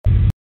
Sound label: engine; fancade